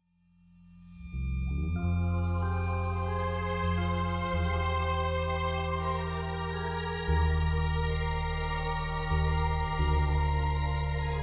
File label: Strings